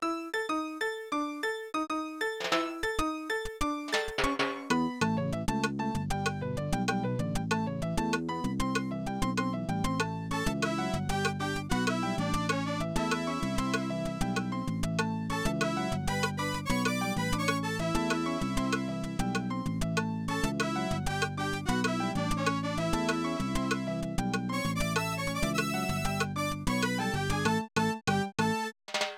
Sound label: Salsa (Aircheck); animal crossing gc rip